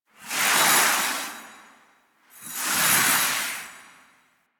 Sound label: Foley Idle Rabbit Pet; Ambience; Teshin; Duviri; Warframe